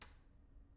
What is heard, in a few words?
switch; IEEE AASP